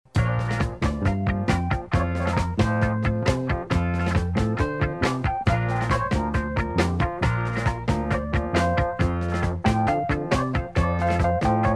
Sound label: Cajun